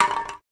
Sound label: Impact Can